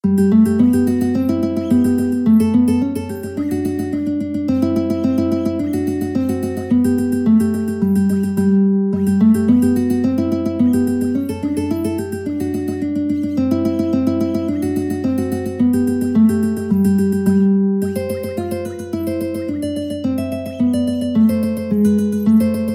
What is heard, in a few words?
All Through The Night, mandolin